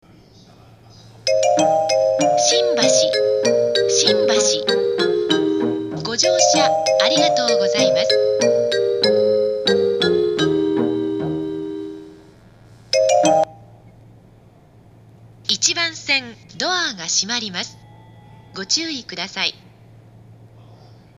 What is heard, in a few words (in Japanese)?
発車メロディー, 新橋 横須賀線ホーム; 接近放送は「久里浜行（１１両編成）」です。; １番線; 東京・錦糸町・船橋・千葉方面